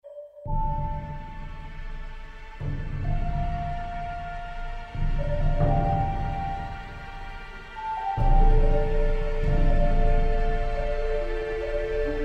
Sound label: TENSION